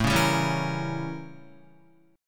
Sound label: A 7th Flat 9th A, Guitar; strummed